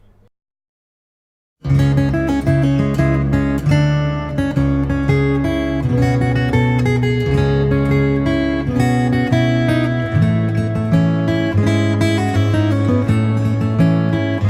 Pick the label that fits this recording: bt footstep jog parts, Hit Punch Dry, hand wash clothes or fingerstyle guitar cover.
fingerstyle guitar cover